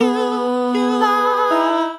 (B maj), Basic Vocal Racks; Voice Box; Harmonic Vocal Racks

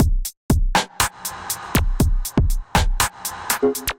Kit, Drums, Hybrid; Dublyn Kit; Ableton; Factory Packs; Drum Essentials

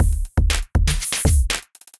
Electrified Kit; Electrified Kits; Ableton; Bomblastic

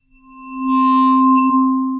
Vibraphone Bowed; Ableton; Orchestral Mallets

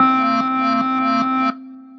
Light Vs Dark Lead, Soaring Leads; Ableton; Mood Reel

